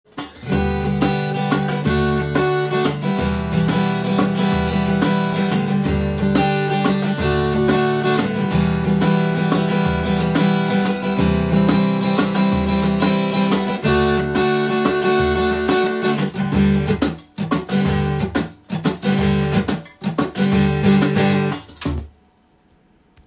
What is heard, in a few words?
percussion and rhythm section